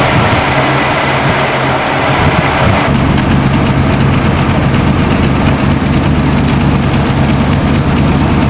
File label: front twin, v8 mini